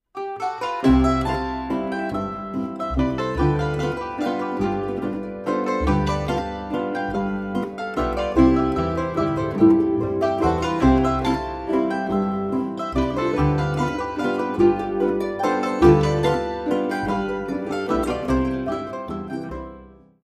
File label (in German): Spielmusik Saitenquintett